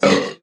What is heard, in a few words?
burp short